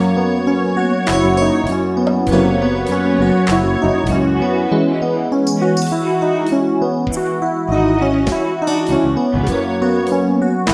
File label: Pop; Deep Composer